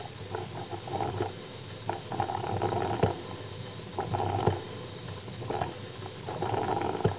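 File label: coffee percolator; 12000 Graphics Pack; Media Graphics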